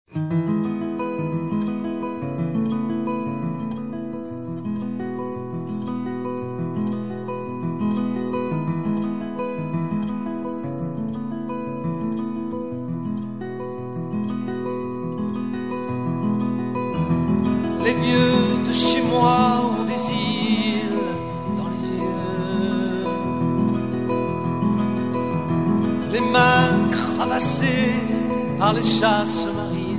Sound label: acoustic